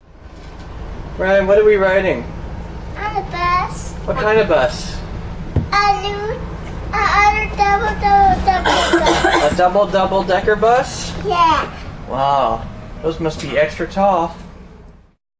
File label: double bus; scotland